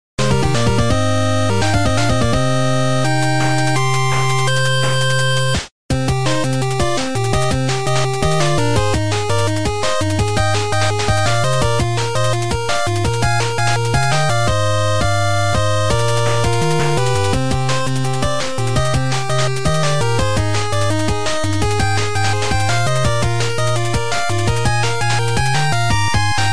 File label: 8bit; UMvC3